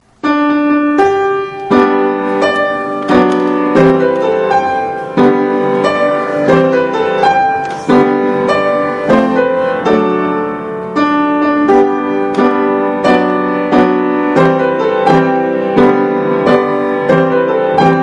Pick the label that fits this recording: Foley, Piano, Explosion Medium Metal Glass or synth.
Piano